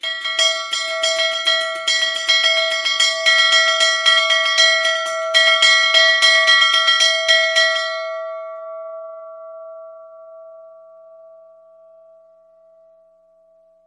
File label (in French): cloche